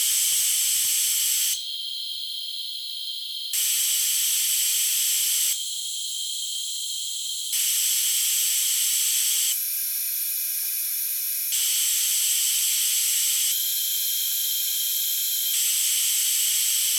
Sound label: piezos noise paper